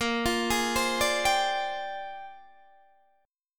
A sharp 13th, Guitar DADGAD

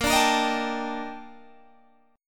A sharp Major 13th, Guitar Standard; A#M13 strummed

